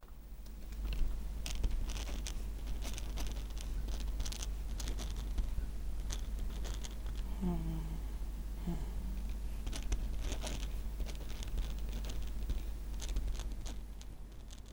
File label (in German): Schreiben